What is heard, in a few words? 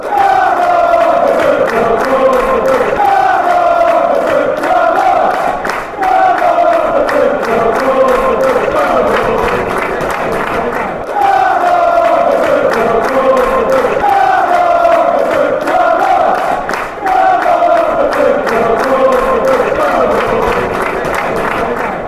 come on come on, chants; brentford